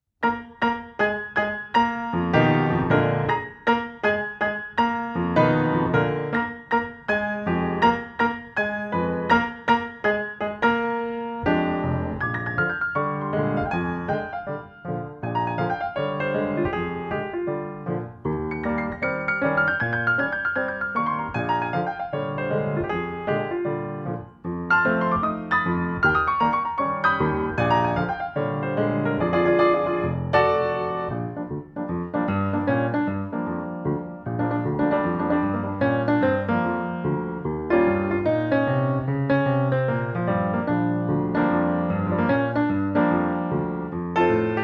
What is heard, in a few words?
Recorder Instrumental, piano